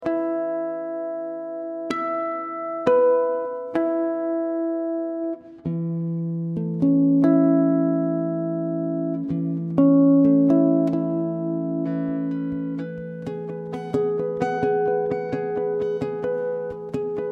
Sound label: E 130 GTR harmonics